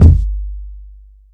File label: long room reverb bass drum sound G key, kick sound tuned to the G note. Loudest frequency: 94Hz